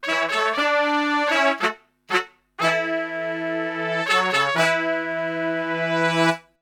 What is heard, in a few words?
POP D-E, Brass; Best Service; Brass Super Section; POP LICK